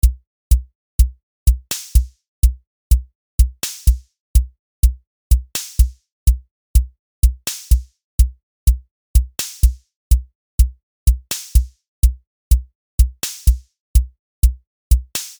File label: tango; tiny pops; superwave